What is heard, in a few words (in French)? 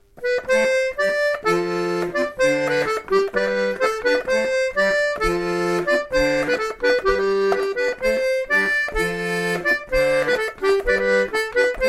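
bourree, accordéon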